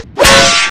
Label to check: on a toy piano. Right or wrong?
Wrong